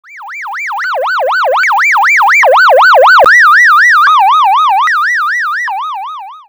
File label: doppler; final output doppler 100